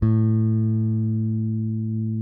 Whole Notes A, Bass; Bass X

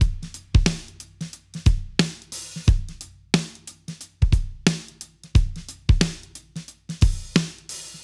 Spenny Beat 89.50 bpm, drums; Basement drums vol 1

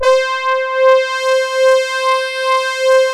GR LEAD, JV-1080; Syntec; Wall of Sounds

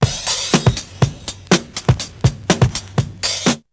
Drumloop; ZERO G - Datafile DISC 3

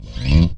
BS CHPSTK, Chapman Stick; Roland L-CD701

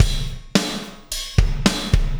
Beat, Processed Drumkits

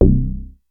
Monopoly bass

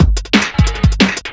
Speed loop, Drums Beats

